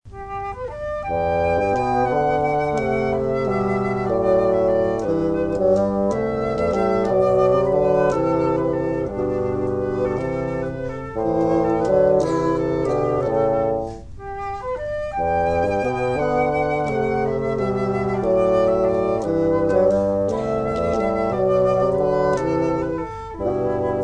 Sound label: Slow Dance